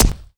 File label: sniper hit, bullets; weapons